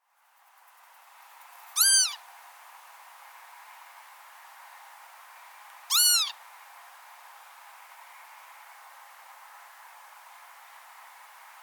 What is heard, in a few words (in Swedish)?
Tofsvipa